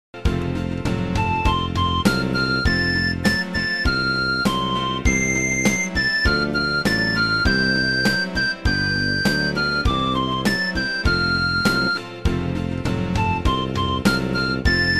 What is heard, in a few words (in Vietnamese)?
nhac khong loi qua beat qua gi